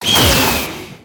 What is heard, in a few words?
Attack; Violet